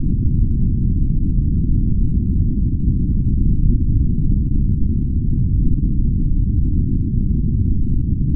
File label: space ambient; svencoop; sectore